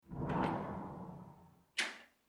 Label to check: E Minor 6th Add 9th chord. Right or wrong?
Wrong